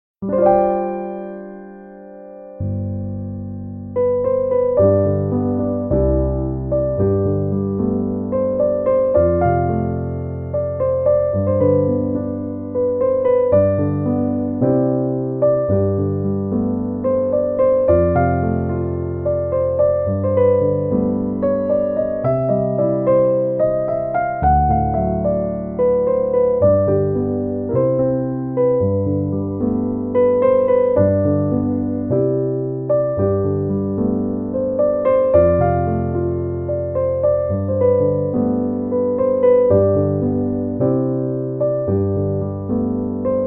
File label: Piano Instrumental